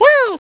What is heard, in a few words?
bounce idle; Nokia; 5800 XpressMusic; Bounce Touch